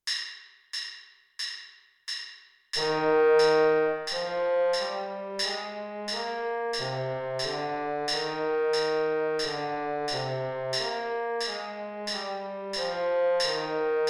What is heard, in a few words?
e flat major scale trombone baritone